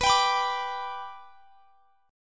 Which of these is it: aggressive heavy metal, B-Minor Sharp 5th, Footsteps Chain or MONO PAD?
B-Minor Sharp 5th